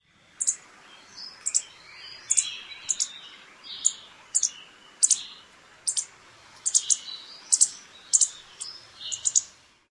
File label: chip calls